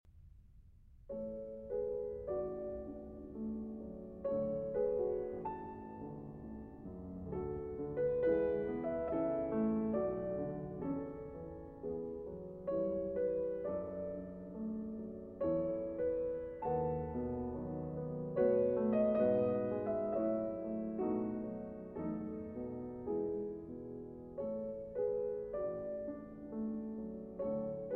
Piano Pieces, Intermezzo in A Major (Andante Teneramente)